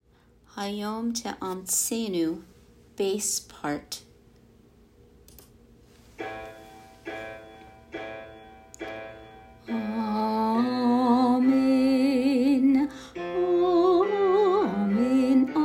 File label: Bass; High Holidays; Hayom